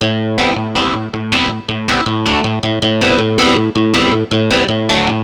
Synt; FUNKIN&GROOVIN'

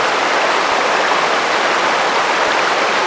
TRAM

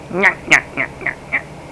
nyuk nyu; People; Sound Library 2000; Wayzata Technology

